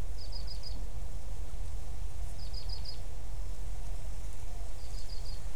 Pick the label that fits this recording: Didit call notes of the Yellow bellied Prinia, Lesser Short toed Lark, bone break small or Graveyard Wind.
Didit call notes of the Yellow bellied Prinia